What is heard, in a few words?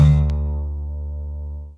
lyra; theodyssey